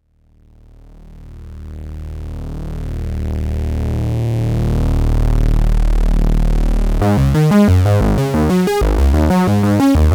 3VCO live Sequ Trig FM VCF; GRPA4

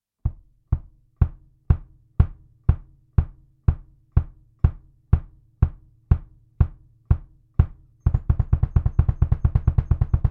Double Kick

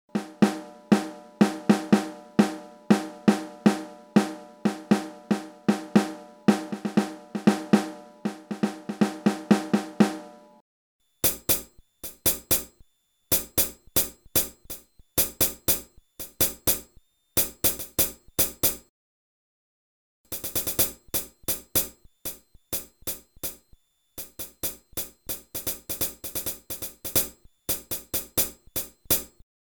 snare, hihat, drum; drumport

